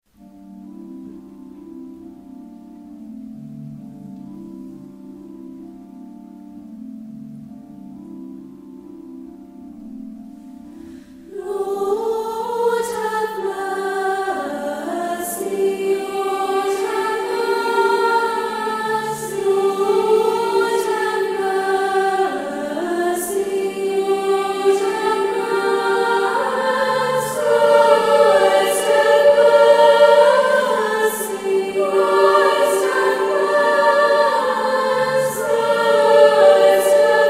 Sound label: Lord, have mercy (descant version)